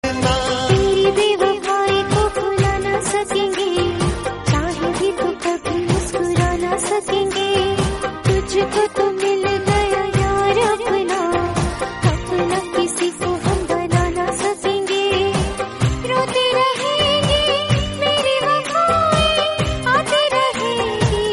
female

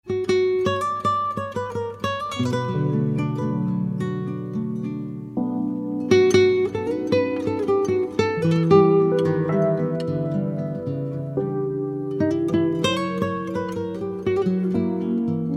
romantic guitar

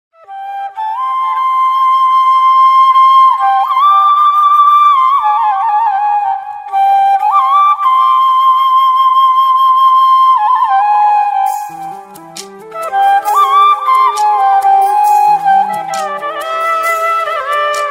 best cool bansuri

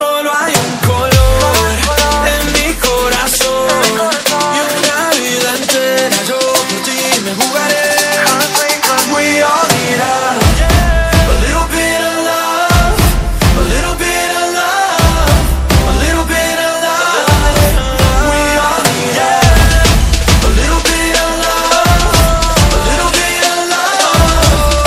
columbian love ringtone

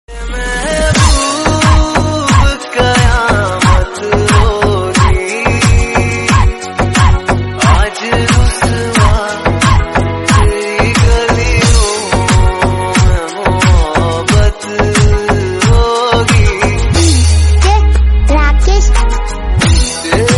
dj remix ringtone

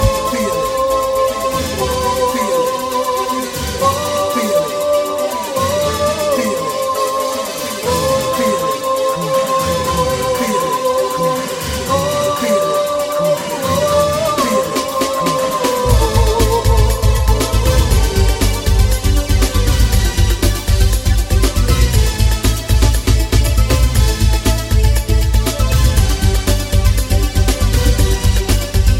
latin freestyle ringtone

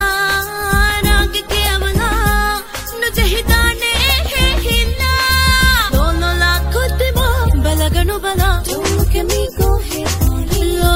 ringtone

